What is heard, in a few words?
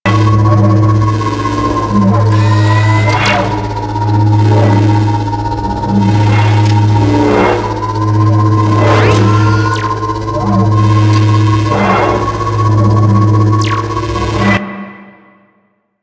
Computer Harvesting Station; Ambience; Props; Warframe; Corpus Railjack